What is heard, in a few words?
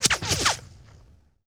Bullet Whiz By Multiple; Sony Pictures Sound Effects Series; Ricochets - Hits - Whiz Bys